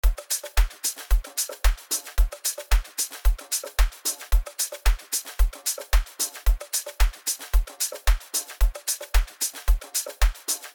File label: Piano